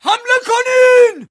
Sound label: charge, Voices Emotes